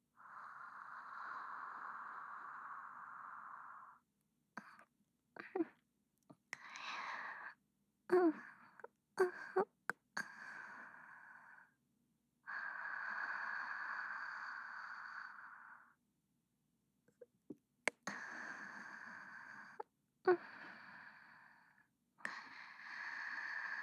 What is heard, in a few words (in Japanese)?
添い寝呼吸音; お姉さんママ